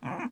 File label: pig select; propack